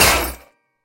Bolts; Peace, Death; Fatecard

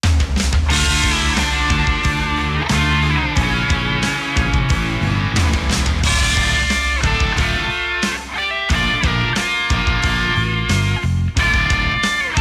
Match Loss; mario strikers football battle league; Against - Wario

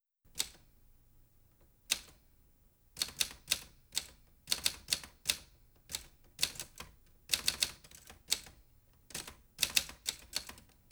Typewriting Synced; Lucius III

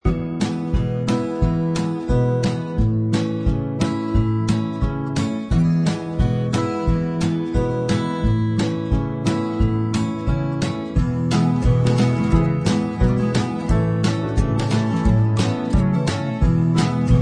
Ingame Music; hay day; android

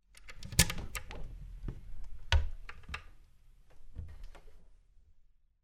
Cupboard unlock and open

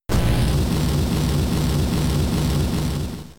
FLAMETHROWER; Pokemon; Gen 3; attack moves; RSE

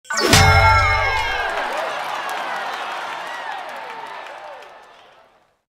(Jingle) Silver Cup

